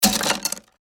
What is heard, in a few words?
Wood Debris Foley Small Pieces Falling On Wood Floor; Materials; BBFX